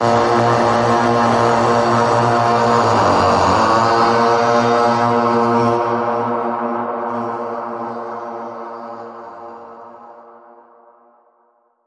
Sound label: Warriors Way Battle Horn